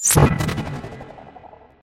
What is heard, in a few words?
portal open, portalgun; portalmod gun; weapons